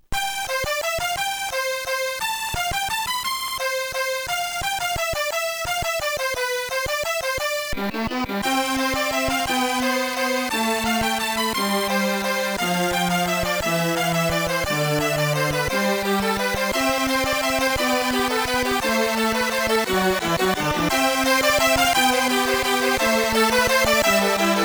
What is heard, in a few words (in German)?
Klassik; A500